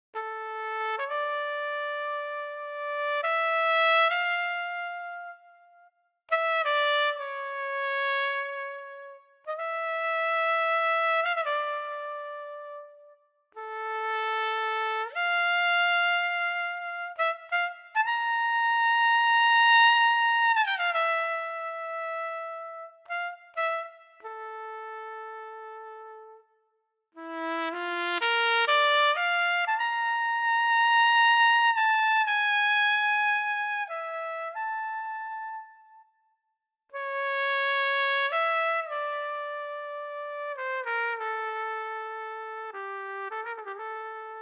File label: Breath Controller with Sample Modeling Trumpet